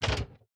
repair pack inventory pickup; factorio 2.0.55

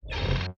rocket control inventory move; factorio 2.0.55

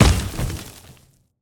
car tree wood impact; factorio 2.0.55

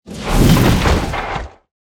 car debris; factorio 2.0.55

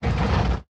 vehicle inventory move; factorio 2.0.55

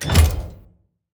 metallic chest close; factorio 2.0.55

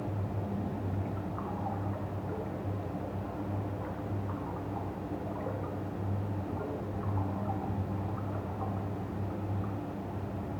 storage tank; factorio 2.0.55

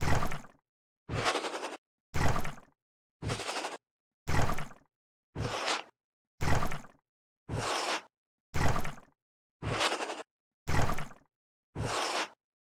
offshore pump; factorio 2.0.55

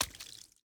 shallow water, walking; factorio 2.0.55

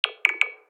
construction robot; factorio 2.0.55

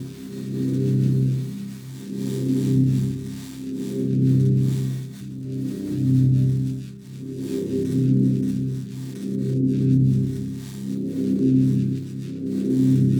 beacon; factorio 2.0.55

